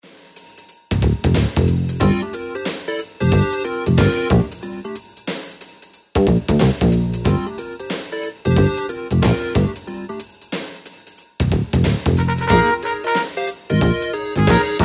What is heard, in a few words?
Go Go Jazz